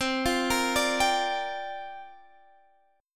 C 7th Suspended 2nd Suspended 4th, C, down, Guitar, Drop D